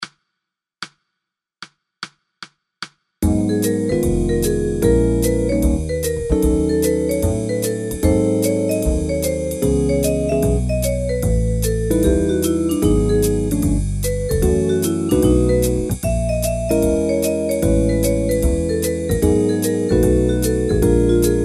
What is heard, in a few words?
Blues Minor